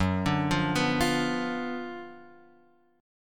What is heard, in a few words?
Gb Major 7th Suspended 4th Sharp 5th Gb down Guitar Open F; GbM7sus4#5 arpeggiated; JGuitar